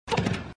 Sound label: klapper; MinorityReport